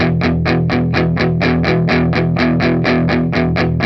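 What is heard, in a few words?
rhythm 125, Vintage Blues Guitar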